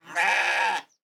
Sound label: goat; battle